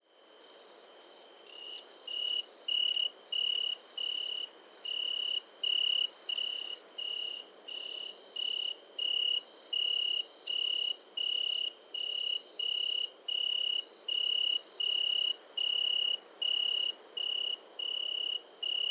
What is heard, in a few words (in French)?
grillon d'Italie et grande sauterelle verte; Le Puech Cantal